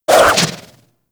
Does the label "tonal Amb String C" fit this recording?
No